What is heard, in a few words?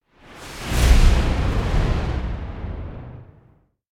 Whoosh Fat Storm; Hollywood Edge; sound designer's toolkit v2